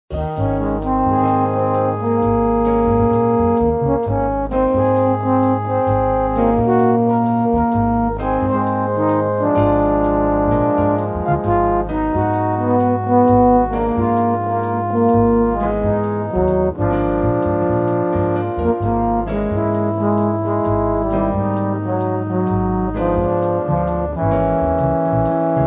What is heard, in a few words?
trombones